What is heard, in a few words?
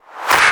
Clap, Dubstep One-Shot Drums